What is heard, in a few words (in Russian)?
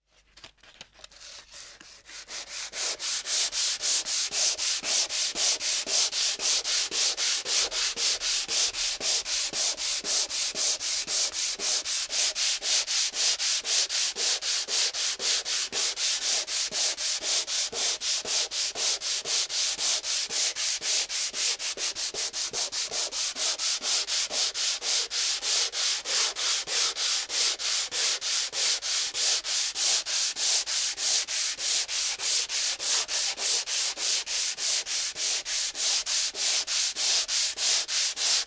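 Наждачная бумага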